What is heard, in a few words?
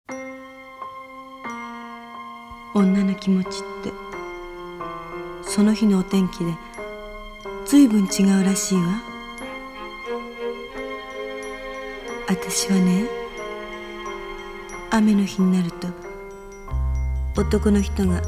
rechitativ yap sax sexy, japonese saxophone